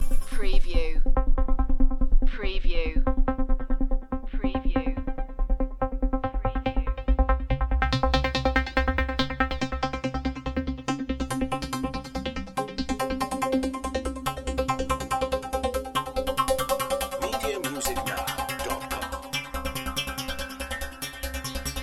Techno-Trance Loop in C 142 BPM; lynne-publishing

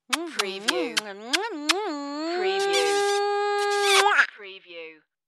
Big Love Kisses

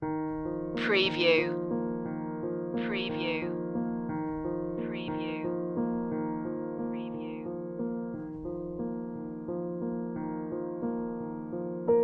almost a lullaby piano only

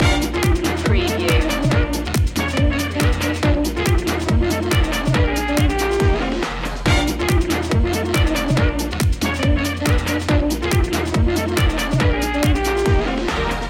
shuffle kerfuffle loop

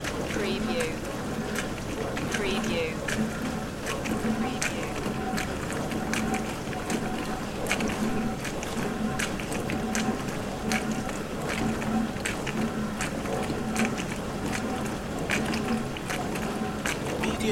dishwasher running

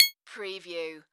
Multimedia Beep

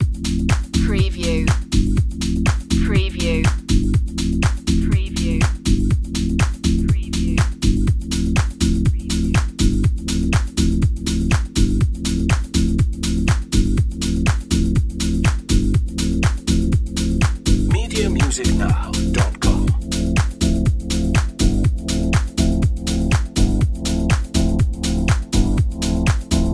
deeper grooves

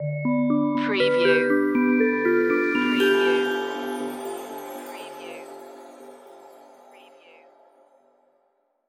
fairy dream magic stinger

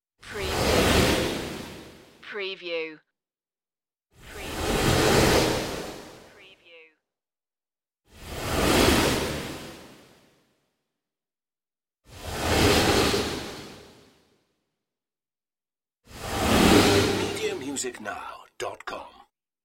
windy flyby whooshes

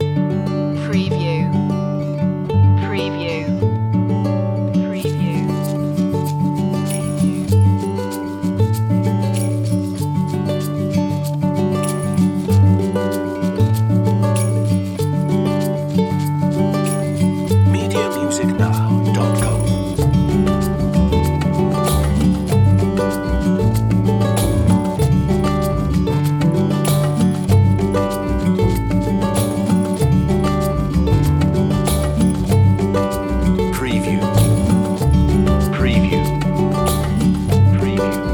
think casual no drums

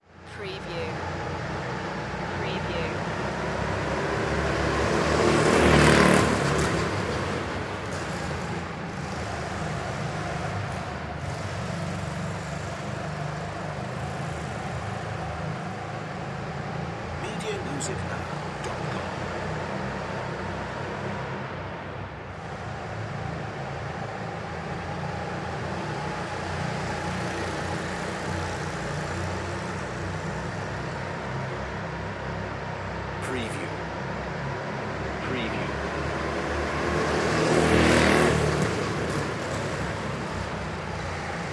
Go Kart Drive Around; sound-effects-bible

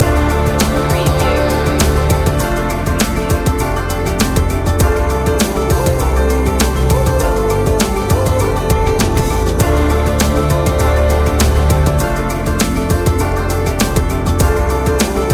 tribal trip hop loop

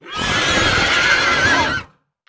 Monster Demon Horse

